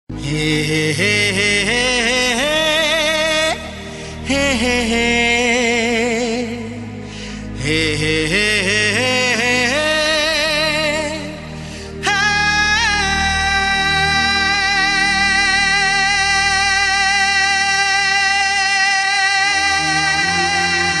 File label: aaaaaaa; Indian